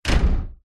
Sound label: impact slam hit hard; sound design